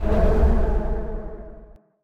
revenants aoe end; muerta

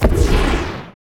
attack, weapons; warlock

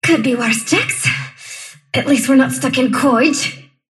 sylph death; dota; dark willow

